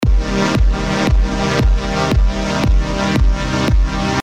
Comp ducking

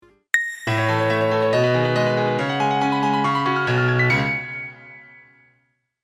orchestration

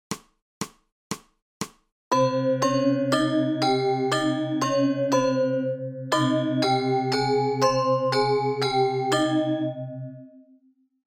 scale

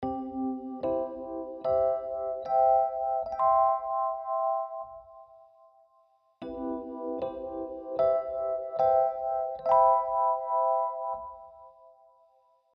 c cmaj7

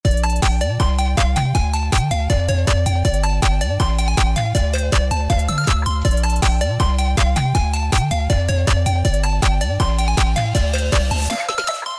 Chord Default

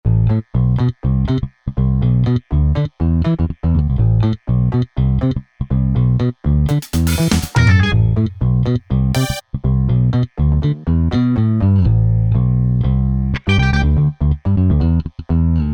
Bass

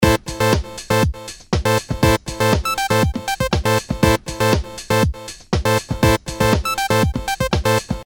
Feedback

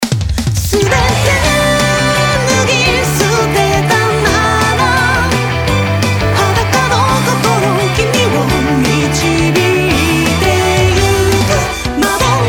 turbo off

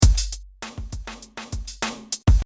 drum korg

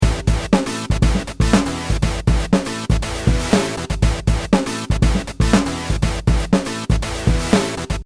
synth bass

